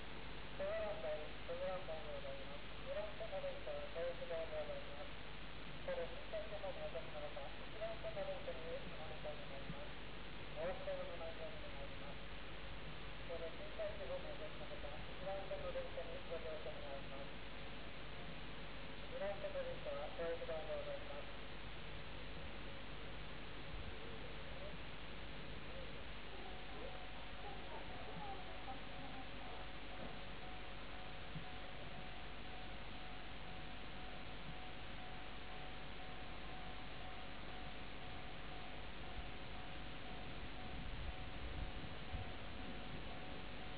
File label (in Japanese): kobe suzu